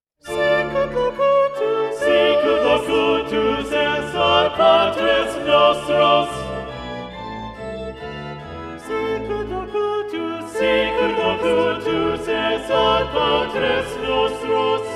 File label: Balanced Voices; Sicut locutus est and Gloria Patri; Durante; Magnificat